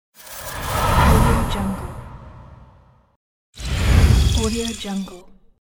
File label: magical whoosh; wavbrulee